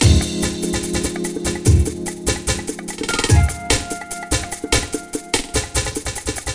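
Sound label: jazz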